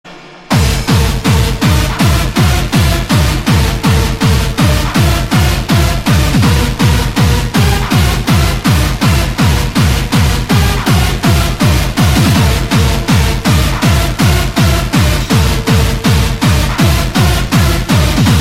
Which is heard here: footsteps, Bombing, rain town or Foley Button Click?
Bombing